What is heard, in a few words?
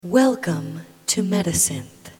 odd harmonic